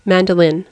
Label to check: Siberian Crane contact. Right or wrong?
Wrong